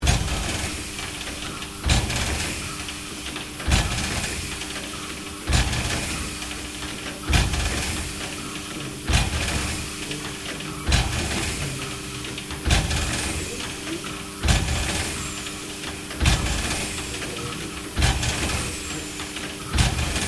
PUMP BILGE OPERATING LOOP, Sounds onboard HMAS Castlemaine; Boat Sound Effects; Boats (Sound Effects:Vehicles)